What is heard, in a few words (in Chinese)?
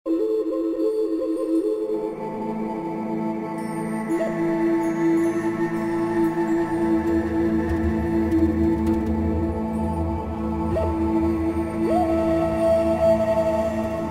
专题片背景音乐